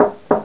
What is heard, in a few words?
Knock